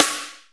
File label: Snare, Akai MPC-500; Drum Machines; Toolkits; Snare TKit